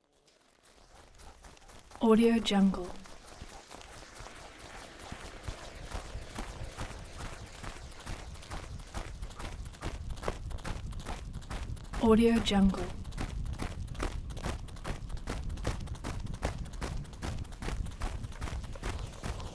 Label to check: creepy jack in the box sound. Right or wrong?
Wrong